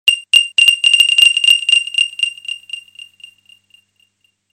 Pingpong

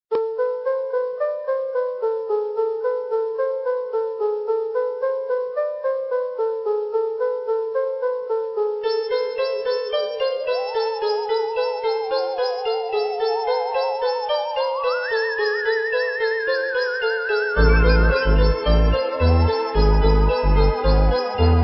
Horror; Ghostrider

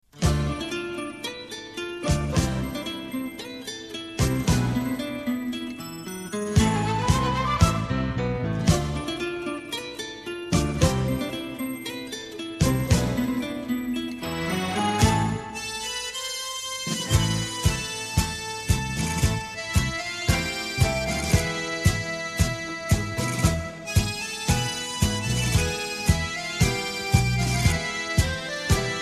Guitar Romantic

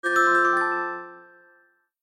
ps4 notification

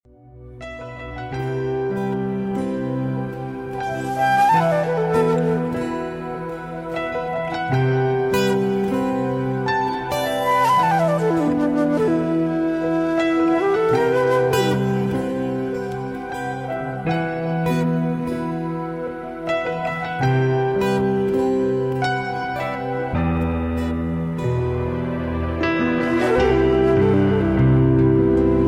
Meditation

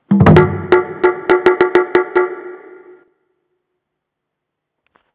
Movie Tense

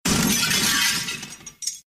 Shatter

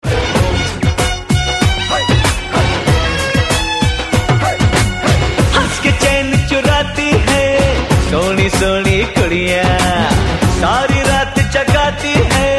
Love Beats

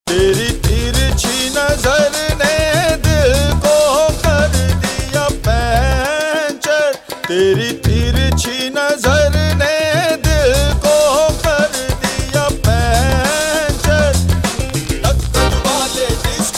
Qawalli

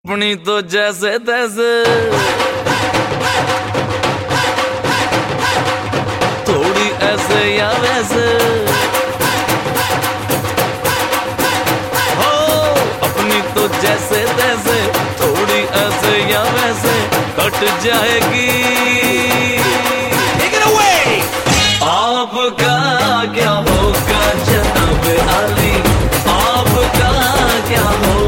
Beats

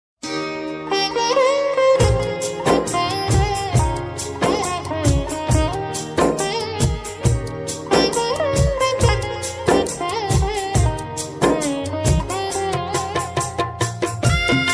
Rock

